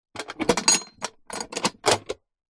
box container industrial metal small lock then unlock